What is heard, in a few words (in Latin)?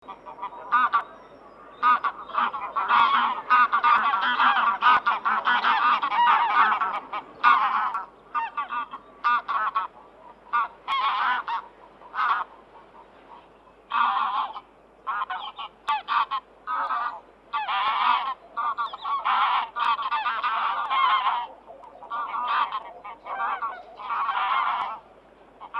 Anser anser; aves